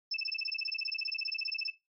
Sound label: Cyber